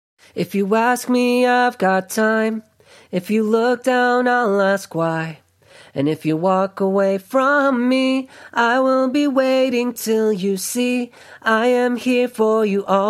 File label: pop 167 GM